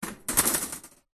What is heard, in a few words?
household air fryer oven metal grill tray set down; zapsplat